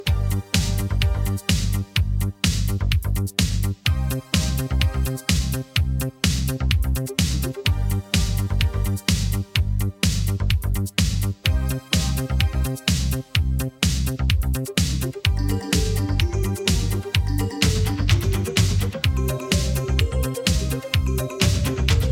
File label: Instrumental Version